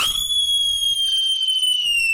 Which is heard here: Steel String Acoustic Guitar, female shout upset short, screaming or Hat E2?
screaming